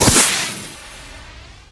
launch, fireworks; HunterZ G4; minecraft

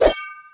sword attack; matmos interactions

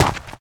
gravel, dig; HunterZ G4; minecraft

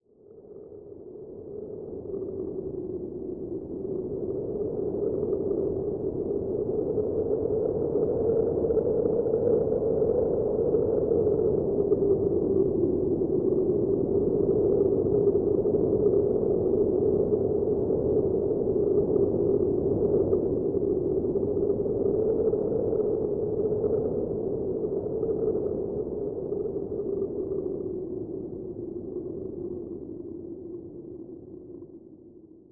wind snippet; matmos hl

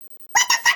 creeper fuse

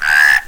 frog hit

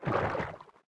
water underwater; matmos interactions

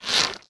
bow stretch; matmos interactions

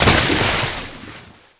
water dive in; matmos interactions

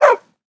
bark, mob wolf

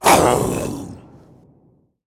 zombie pig angry

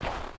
snow, dig; HunterZ G4; minecraft

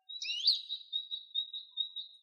coast bird; matmos hl; wildlife

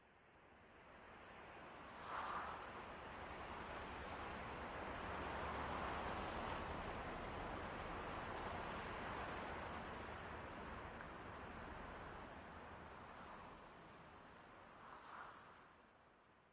tree wind; matmos hl

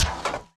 walk, mob iron golem

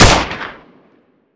Mini Uzi Shoot; Modern Weapons Pack

